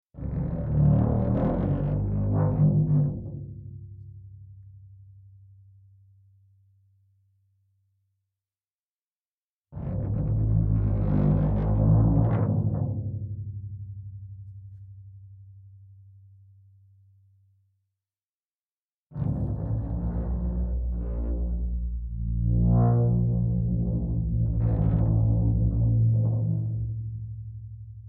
Instrument Floor Tom Drum Friction Mallet Large Vibrate; ShotgunMic; Guild Wars 2 (Original Foley Soundtrack)